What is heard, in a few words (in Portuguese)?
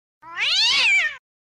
gato miando panico, sound effects efeito sonoro